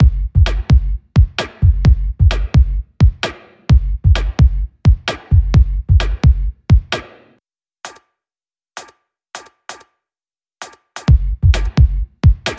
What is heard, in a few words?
beatprogramming